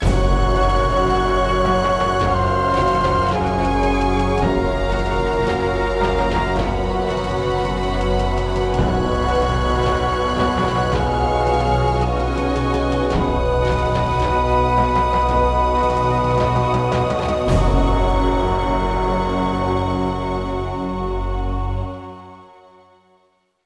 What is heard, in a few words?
victory music; mechwarrior 4 veng